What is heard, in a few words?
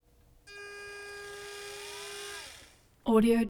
electric wheelchair; prosoundfx